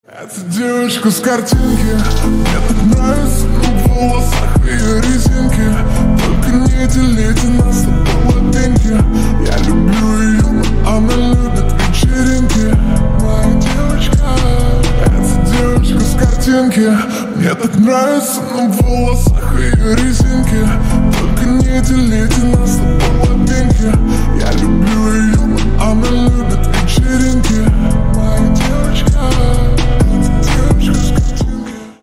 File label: slowed reverb